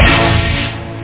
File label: chant; Now Pop Quiz